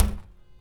Good Thunk; BB Retail Therapy Sample Pack

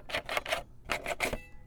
Smooth Texture; BB Retail Therapy Sample Pack

